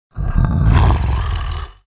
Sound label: Werewolf growling and snarling